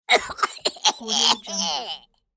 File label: cartoon cough